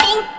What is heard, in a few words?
drum hit normal; osu